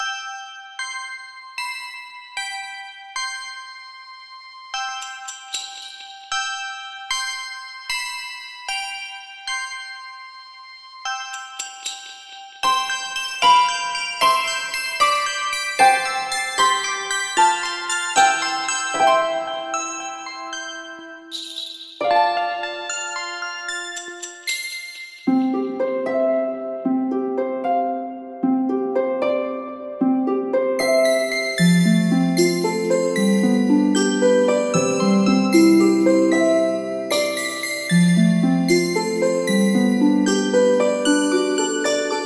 Healing music

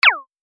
pyun

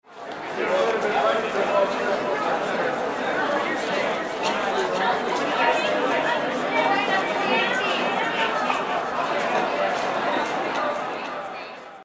crowd

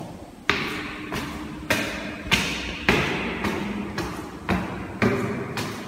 Climb up the stairs

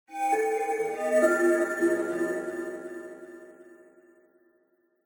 Horror

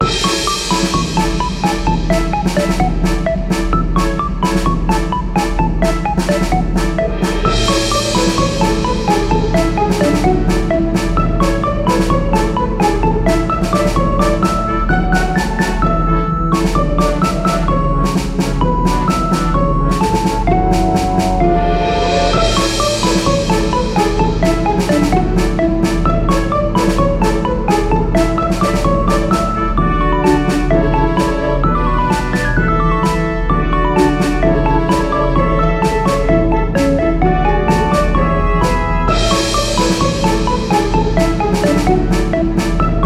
pipopa

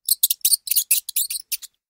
Hamster Sound

